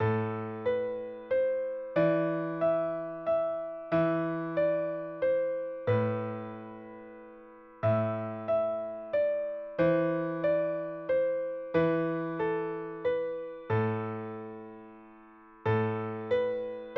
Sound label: ah poor bird, piano